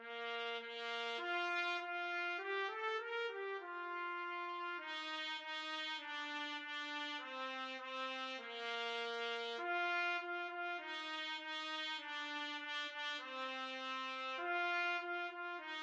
Baa Baa Black Sheep, trumpet

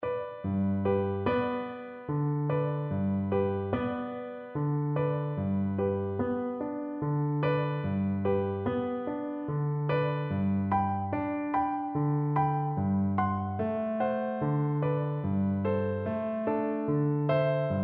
Oboe; brahms lullaby

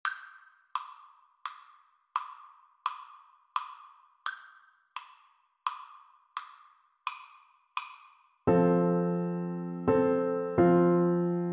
Oboe

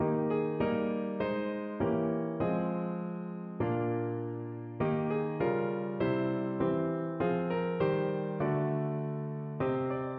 piano

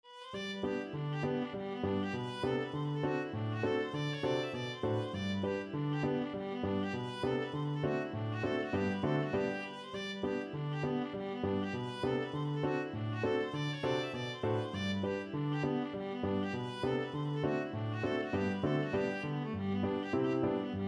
Woodchoppers, viola

